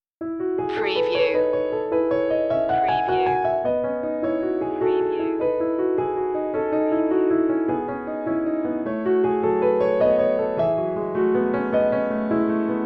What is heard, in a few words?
bach sinfonia in e major allegro; bwv 792